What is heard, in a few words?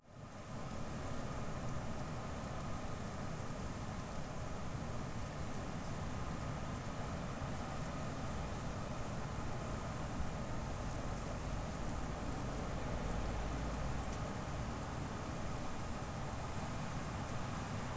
Windy Spring Day Outdoor Ambience